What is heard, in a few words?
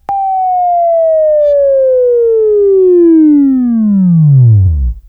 WAV Left, Sweeping; Sweeping Training program